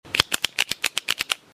pen

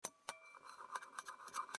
dinner

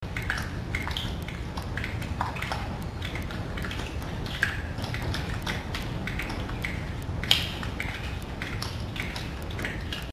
cave

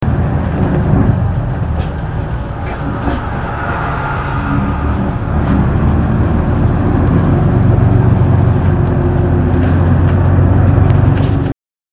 bus

